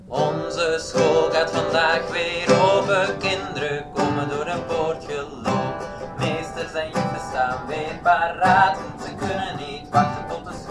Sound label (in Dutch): Lied Zang en gitaar